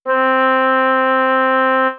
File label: c oboe